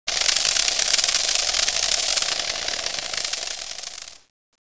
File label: SPRAY